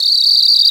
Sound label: Whistle Cricket; Multisamples; Digital Sound Factory Planet Earth